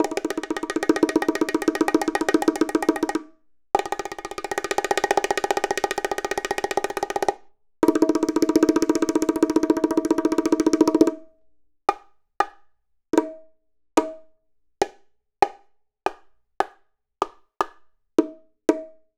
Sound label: Raw Perc Bongos